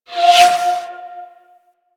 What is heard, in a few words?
Spirit Primary Fire Whizzby A; Warframe; Grineer Thumper Bot; Sentient Spirits